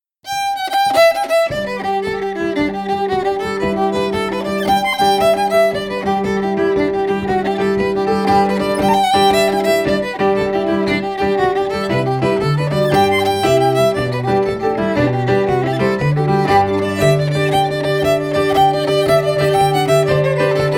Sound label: fiddle; blackthorn stick; SeamusGrantFestival